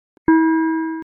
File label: defib beep